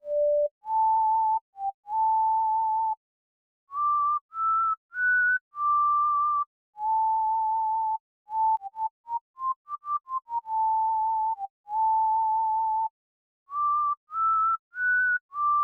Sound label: Namaste whistle version; Omoide ni Kawaru Kimi: Memories Off; Dreamcast